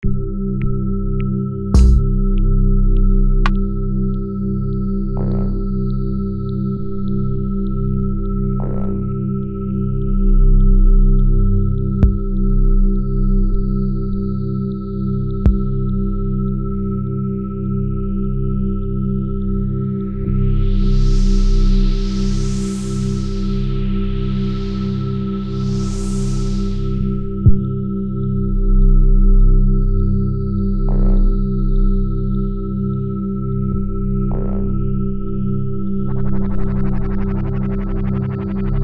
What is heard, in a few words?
ambiance